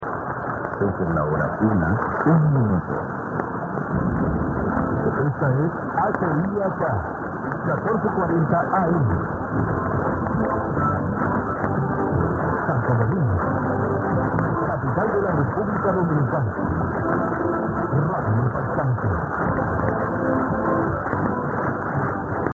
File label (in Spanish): impactante